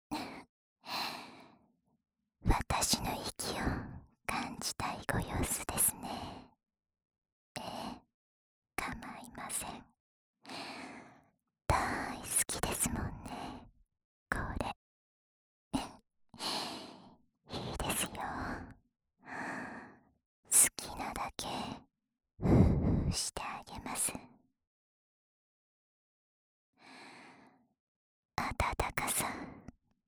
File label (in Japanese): お耳ふーふーループ左耳, ASMR